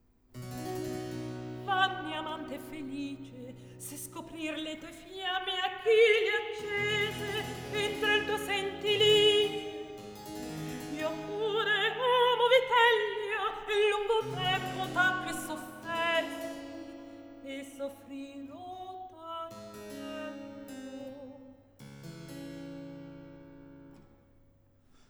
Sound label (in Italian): Recitativo; Atto 1 - Scena 6; Decio; Vanne, amante felice; Antonio Vivaldi; Tito Manlio (2005)